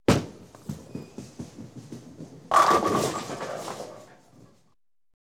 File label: strike, bowling